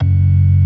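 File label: ORG. F#1; Best Service; Advanced Media Trax Modern Composer; B3 FULL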